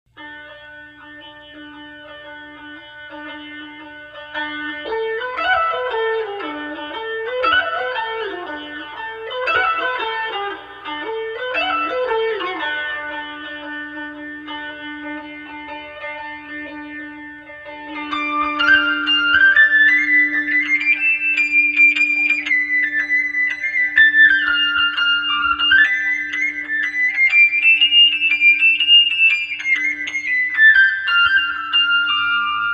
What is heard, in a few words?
Irish Jig